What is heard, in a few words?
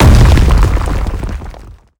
Rocks Break